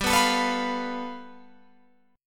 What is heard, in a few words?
G 9th, Guitar Open F; Listen to G9 strummed; G9 Chord